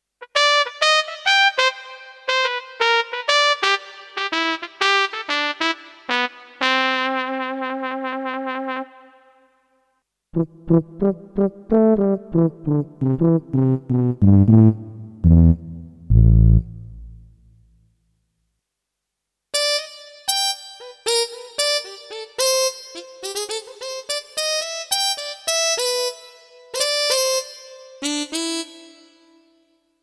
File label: Brass Wood